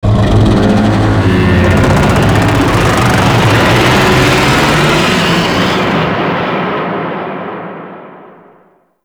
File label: Void Dragon Vocal; Weakpoint Respawn; Enemies; Vocals; Warframe; Duviri